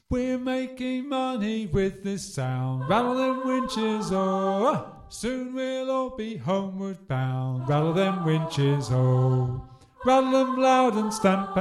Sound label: Rattle Them Winches - Sop; eccleshall singathon